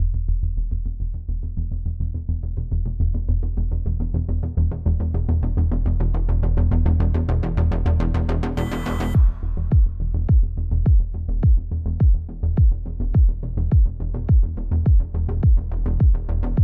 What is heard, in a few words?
lobby music